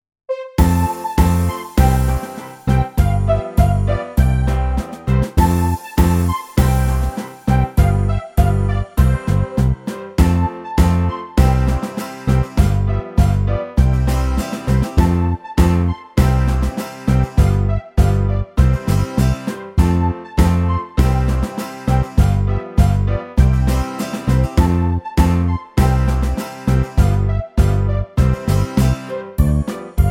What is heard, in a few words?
Karaoke